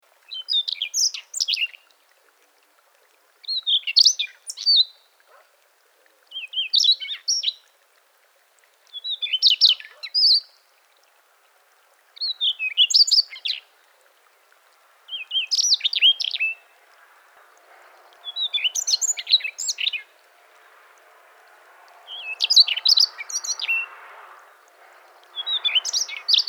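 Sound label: solovey krasnosheyka voice, Siberian rubythroat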